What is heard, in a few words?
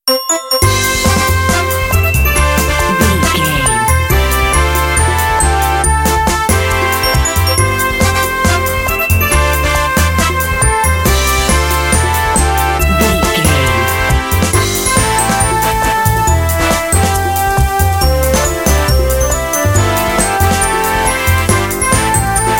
retro tv theme